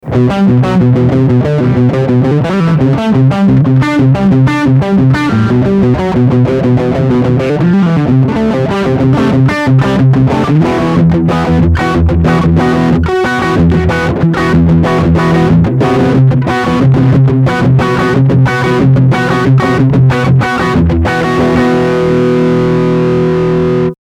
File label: Overdrive Rec Out